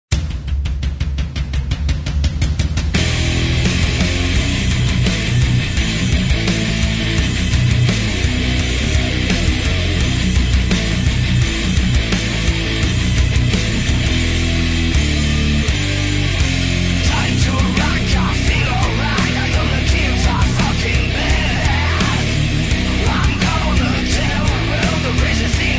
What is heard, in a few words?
Deep Space Mask Heavy Metal Thunder